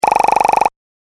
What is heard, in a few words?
Pop